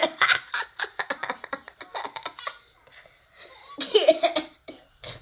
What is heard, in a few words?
Laugh